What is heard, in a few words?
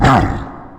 war bomb toss